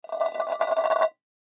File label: Tin Can Down Wobble, Tin Can Empty Knock Over Wobble Cement Surface; Wobbles; Foley; Objects; Blastwave